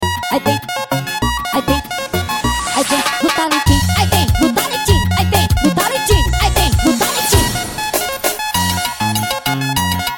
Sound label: melody